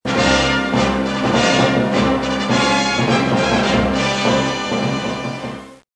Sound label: In city music; Star Wars; Star Wars Misc